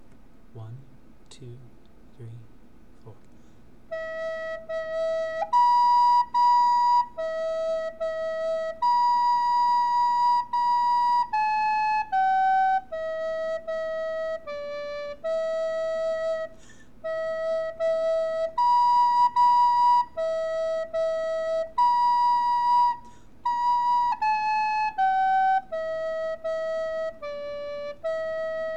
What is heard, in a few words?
prairie lullaby